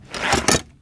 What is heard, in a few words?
Arrow Spawn; Timelock VR